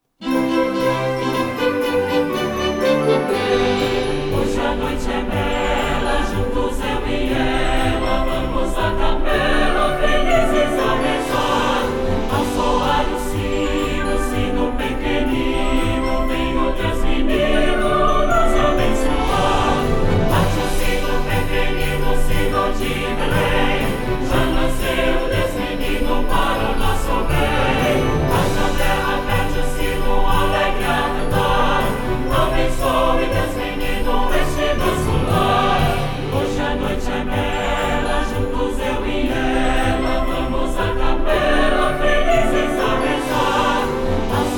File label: JINGLE BELLS CORAL